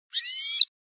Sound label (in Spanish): quejas colio; campero